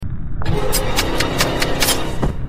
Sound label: death by stabbing